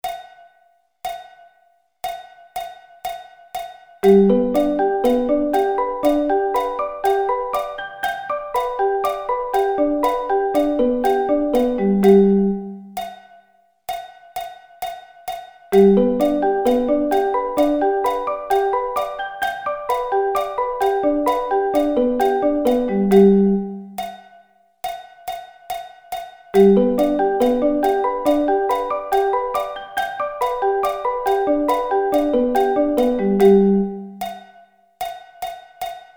Arpeggio Inversions

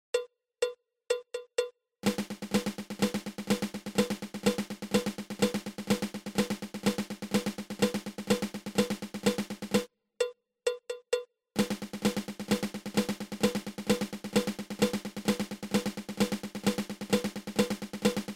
Flammed Mill

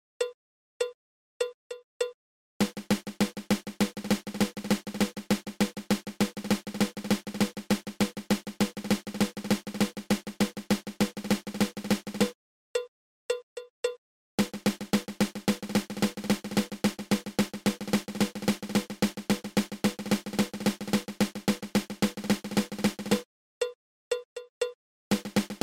Drag

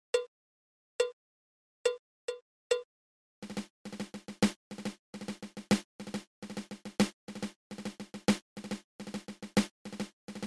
Double Ratamacue

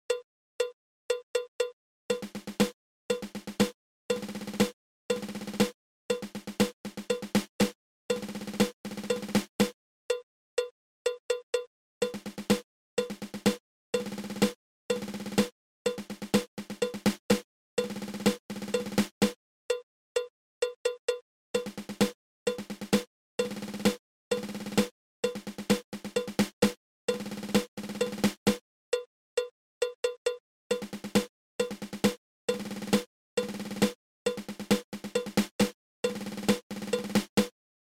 Nine Stroke Roll

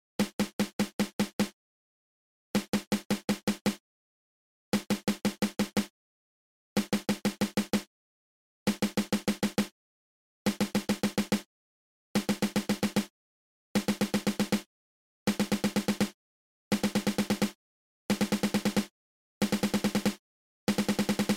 Single Stroke open close open

